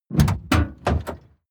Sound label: plane glider brake release